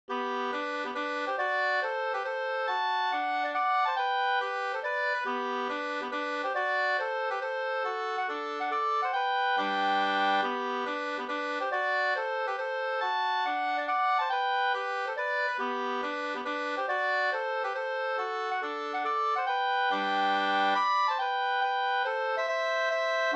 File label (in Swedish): hambopolska